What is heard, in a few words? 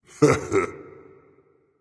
heh heh, voice; Smite; ymir skin6